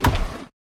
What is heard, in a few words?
Drawer Open